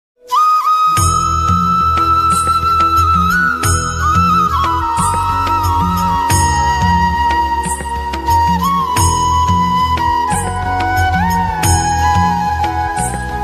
krishna flute instrumental ringtone

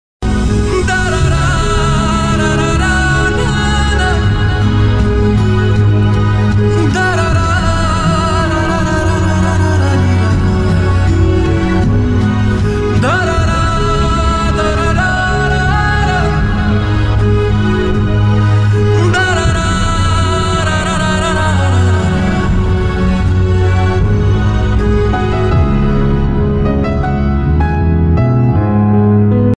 emotional bgm ringtone sad ringtone; jailer

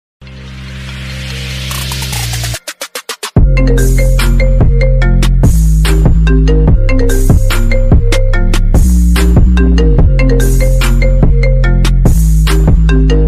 iphone ringtone; apple ringtone 2022

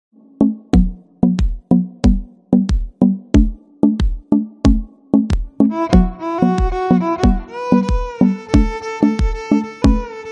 instrumental bgm ringtone; bgm movies zone

